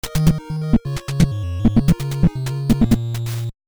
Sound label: Lose to Boss; Joy Mech Fight; NES